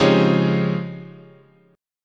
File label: Db Major Sharp 11th, chord